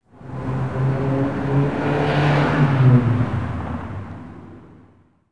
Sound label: traffic